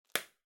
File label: Bubble Wrap (Pop Distant); 梱包材; 気泡緩衝材; プチッ 遠い